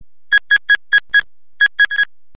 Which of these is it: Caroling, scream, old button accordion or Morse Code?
Morse Code